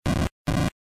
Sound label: sword swing